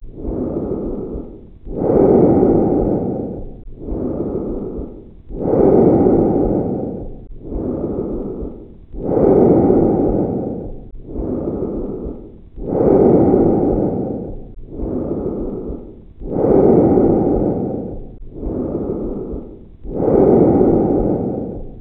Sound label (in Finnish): bronkiaalinen, hengitysäänet; ääninäytteitä auskultaatiolöydöksistä